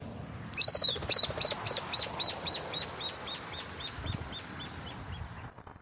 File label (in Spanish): inambu serrano